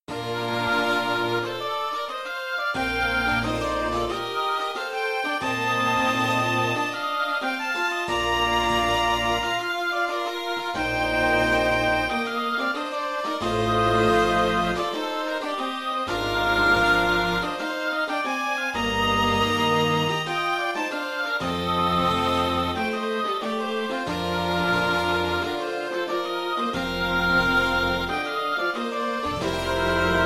orchestration baroque clavecin; suite HWV434

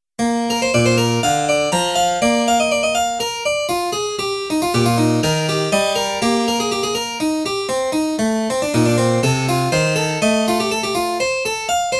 inventions a 2 voix clavecin; bach johann sebastian; 15 inventions

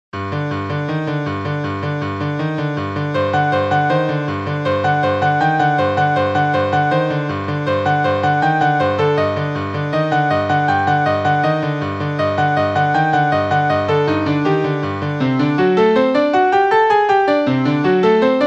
piece pour piano; vignon denys

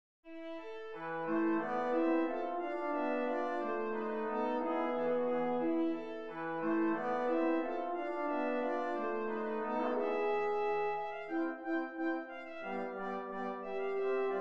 rigaudon mineur

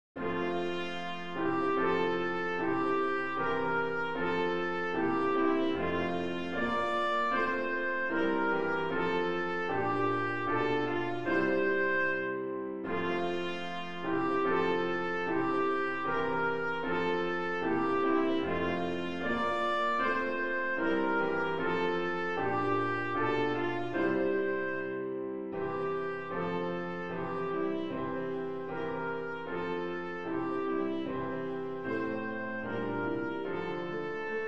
hymne national allemand; haydn joseph

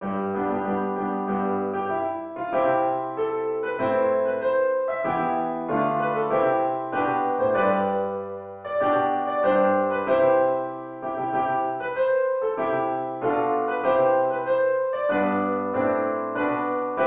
hymne national de Belgique; van campenhout francois

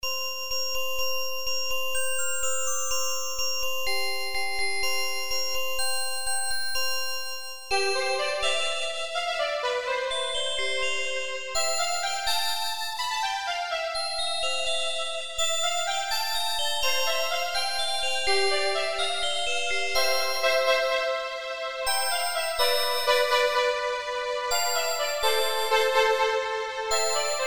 pour violon et glockenspiel; chauveau f bruno sonatine

